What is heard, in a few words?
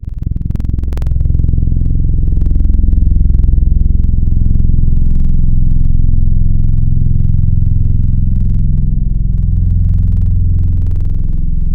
Brass Long; Synthetic's Serum Collection; Surge Sounds - ASTRO - Serum Wavetables